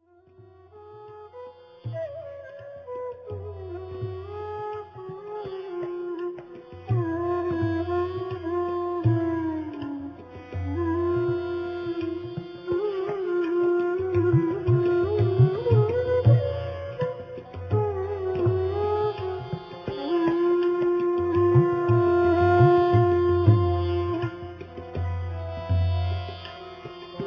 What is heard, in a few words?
Morning Melody Raga Toldi